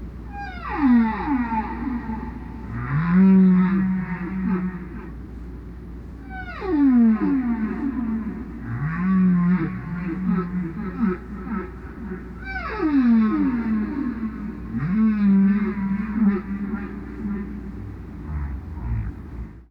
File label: humpback whale, baleen whales